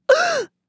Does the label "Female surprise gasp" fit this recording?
Yes